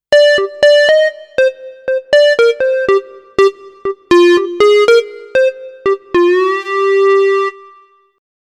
Yamaha Sonogenic SHS 500 Square Lead

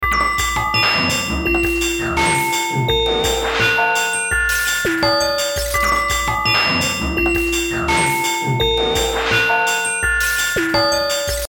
Metal Kit mit One Shots

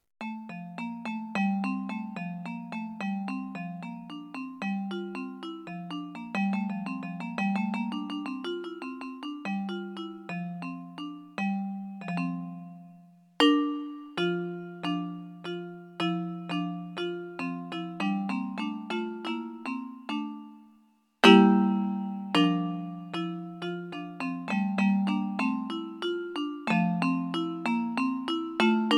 ROLAND SPD-20 PRO Kit Vibraphone

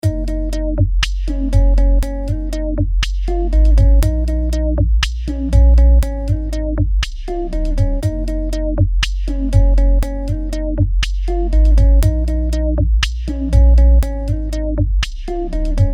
Lazer Whoosh; FilterShaper 3

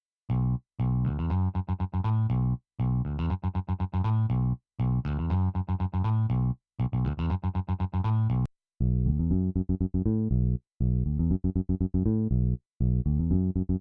Bass; Sampletank

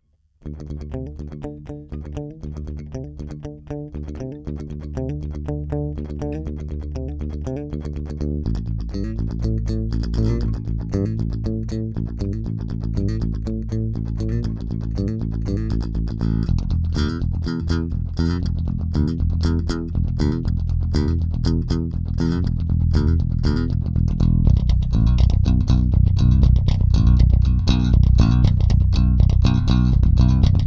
Spector Pickstyle dynamic

